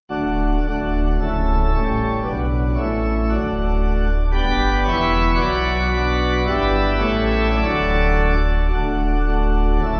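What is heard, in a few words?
Pipe, Pipe Organ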